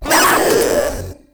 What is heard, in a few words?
Proto Infested Runner Pain, Vocals; Warframe